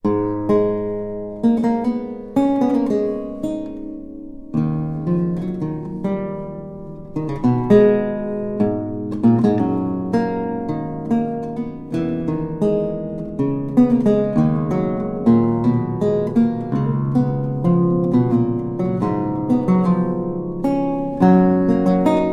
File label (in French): Prelude, Luth; Charles Mouton